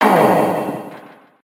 Handegg death

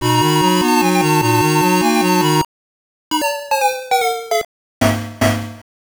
Round Clear; Noboranka; System 1; arcade

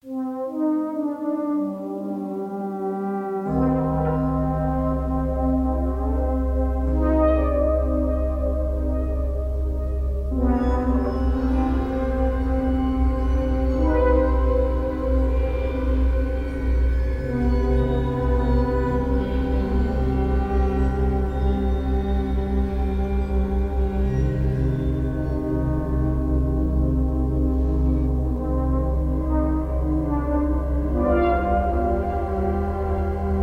Crime Scene Ambient; Hello Neighbor 2

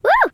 tickle

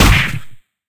Punch short; fight knight gamerip

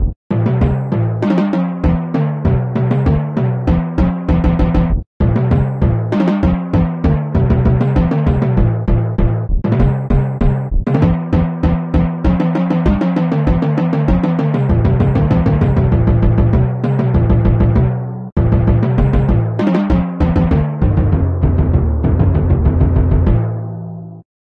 Drum; The Bard's Tale Remastered Trilogy

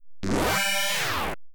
Combo Fanfare; Getaway: The High Speed II; Williams; pinball; arcade

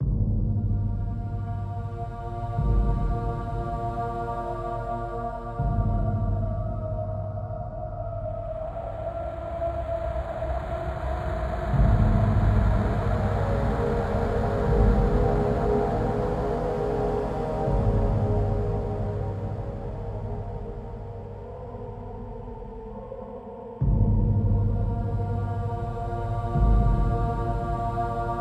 Amb; Amnesia: The Dark Descent

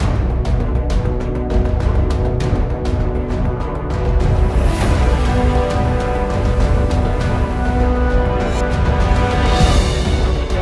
Waves Light

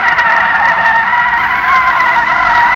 Skid Loop

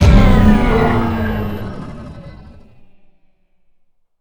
Beast Guts Wave Start

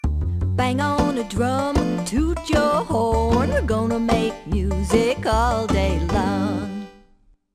Musical Instruments

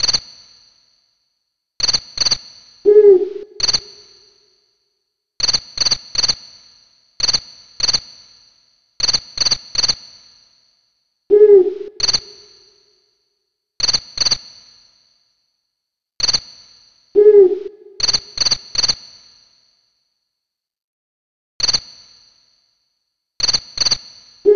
Cricket & Owl Ambience; Spyro 2: Season of Flame; GBA

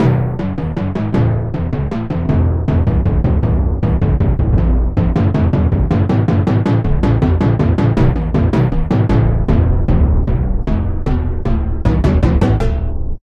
Drum; The Bard's Tale Remastered Trilogy

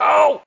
pain

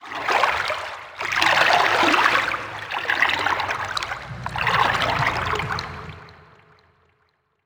environment water calm distant

